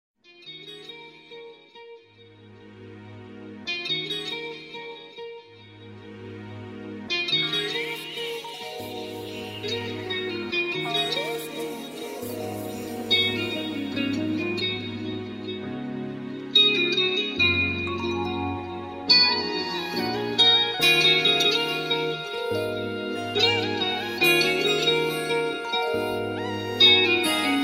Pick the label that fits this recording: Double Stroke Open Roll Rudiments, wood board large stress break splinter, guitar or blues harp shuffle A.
guitar